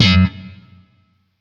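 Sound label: Sentient Shawzin Slap Octave Two F Sharp; Chords; Warframe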